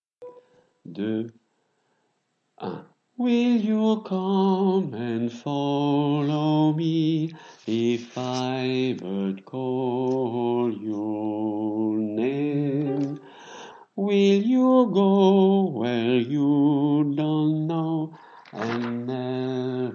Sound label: basses